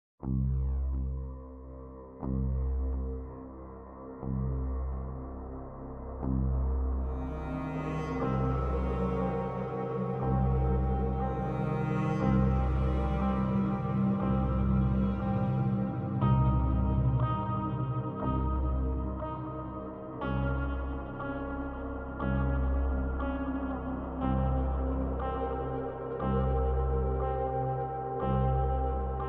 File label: Dungeon; The Wild Age